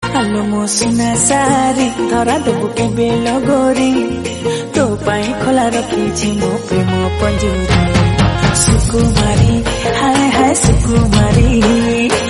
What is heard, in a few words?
Odia Song